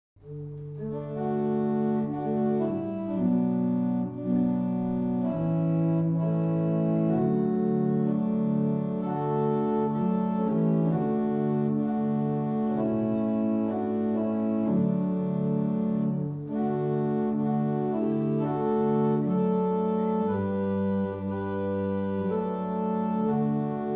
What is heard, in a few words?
barrel organs; Fobbing